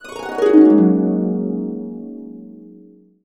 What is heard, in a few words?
Gb, Harps; USB Soundscan vol.62 - Complete Harps; AKAI